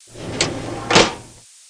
File Cab

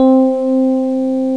piano key high; instruments various; Sound Effects for Multimedia - Volume 1

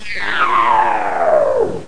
wraith; Total Chaos Nukem 3d Pack; duke

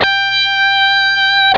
guitar SUS. HI; MGUITAR; Instruments; Wayzata Technology

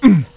Oomph!; Twenty-five Beeps #2

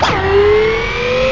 ROBO; ROBO-02; CONTROL INTERFACE

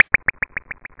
high eko; Shoot 'Em-Up Construction Kit; Palace

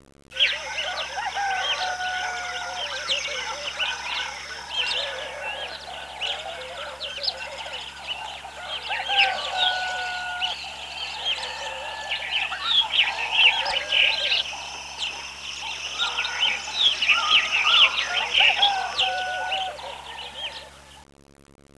morning, ambient forest

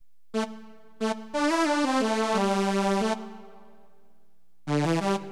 SYN STR, HipHop, Keyboards; LiveAct Pool 1

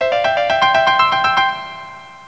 win tune; Children's Atlas of the United States

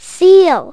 SEAL; animal fun

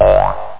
POING; LegoBlast

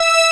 Synth Strings E6; Hexen Demo; Hexen Music; Hexen 6vox

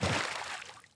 wet step

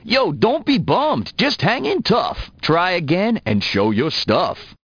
lose

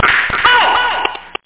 SLAP

